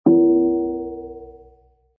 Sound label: hint; mahjongg solitaire